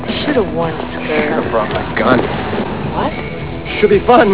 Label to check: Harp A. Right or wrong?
Wrong